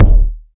verby kick single shot D# key, Royality free steel kick drum sound; kick drum samples